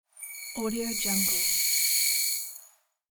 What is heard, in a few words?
pouring sugar or sand